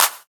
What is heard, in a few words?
Clap